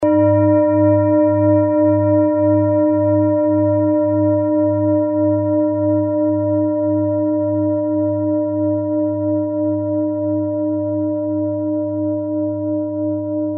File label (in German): klangschale set; Klangschalen-Chakraset N°7 für alle Chakras